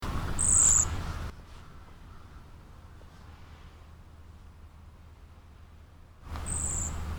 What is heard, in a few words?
cedar waxwing